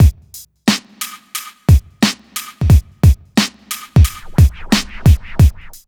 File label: BEAT, Hip Hop R n B; USB Soundscan vol.19; AKAI